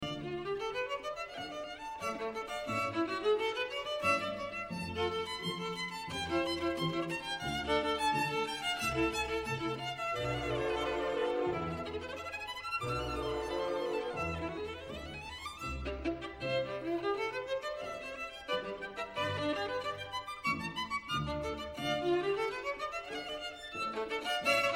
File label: violin concerto in d op 35 allegro moderato